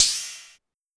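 Ride 808 Up Fast, Drum Machines Clavia DDrum-AT Pitch Envelope; 300 Drum Machines; Pitch Env Various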